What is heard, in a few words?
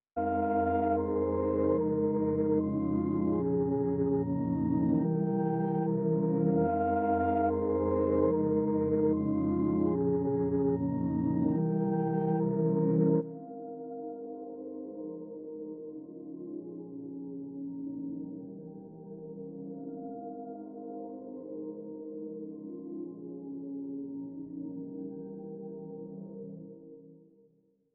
147 BPM F Min Main Keys; Melody; RnB; Loop Stems; Cymatics; 2022 Melody Collection; Limelight